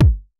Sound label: kick, Roland MC-909